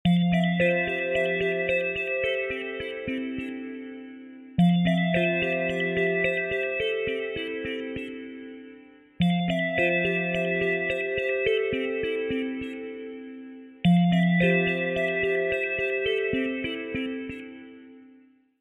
iphone ventilator

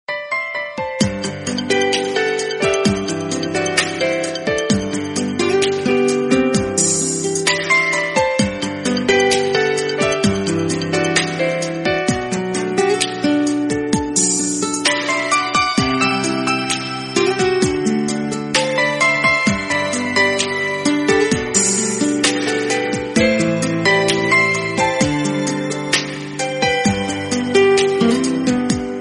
samsung galaxy ringtone; samsung galaxy s20 ultra 5g

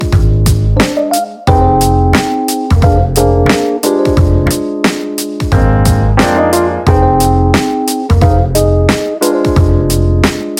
startup iphone

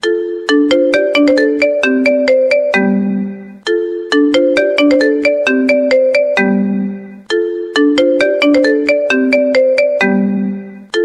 opening iphone 15 plus

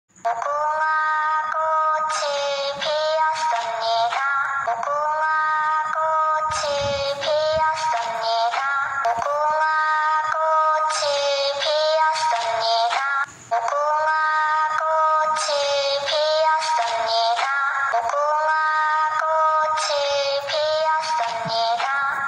squid game nhac chuong

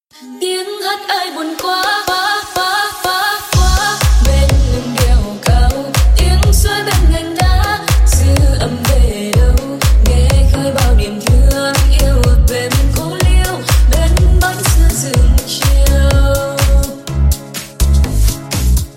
Remix

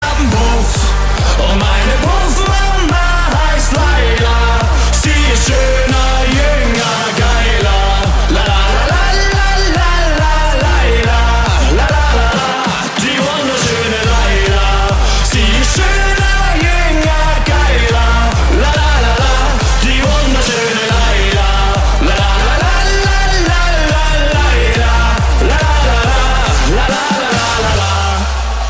Remix

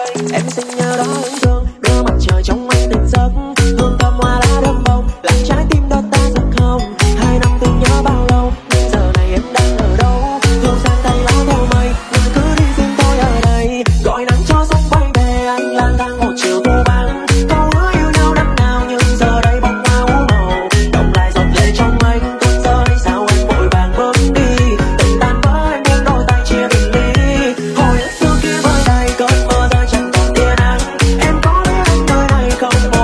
remix

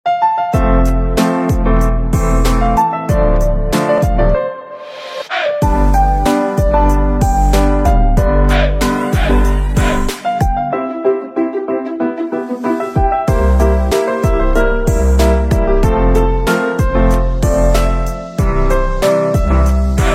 piano; up

